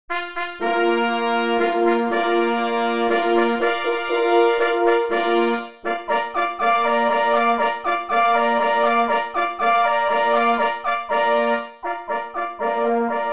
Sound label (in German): Landler gemischt; in b